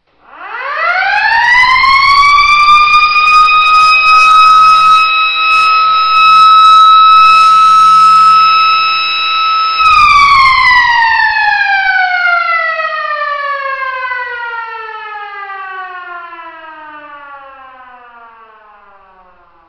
sirene eletromecanica; DP3000; diponto dp1000

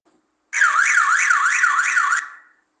alarme multi frequencia; 27C10 NOBREAK; sirene

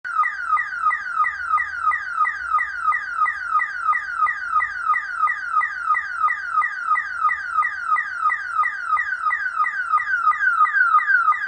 sirene alta potencia

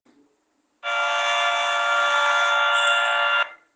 catastrofe, sirene; 27C10 NOBREAK

